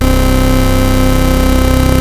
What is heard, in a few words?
C2, Synthesizer, VOX; OB; Hollywood Edge - Giorgio Moroder Rare Synthesizer Collection